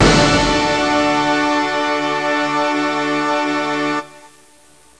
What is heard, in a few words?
FANFARE, ORCHHITS; MUSIC